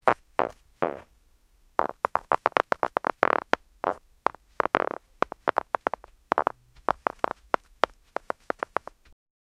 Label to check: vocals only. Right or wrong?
Wrong